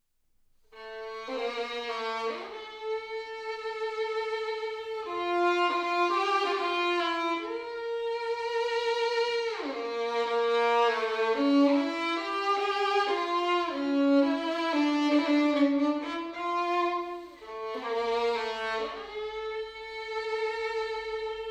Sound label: Violin solo